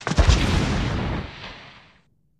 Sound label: Dragon Falls, dinosaurs